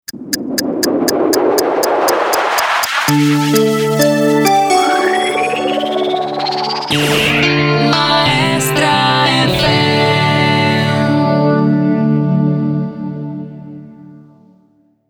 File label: radio jingle; ru-maestro